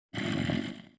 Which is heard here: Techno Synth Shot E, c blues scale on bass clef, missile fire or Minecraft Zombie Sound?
Minecraft Zombie Sound